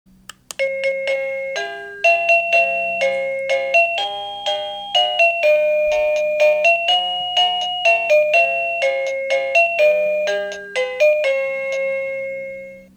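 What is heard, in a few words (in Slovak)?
Bezdrôtový zvonček melódie ukážka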